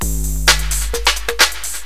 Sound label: drum